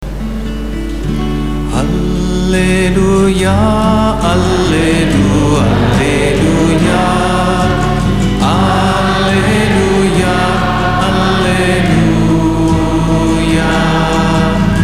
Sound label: alleluia aleluja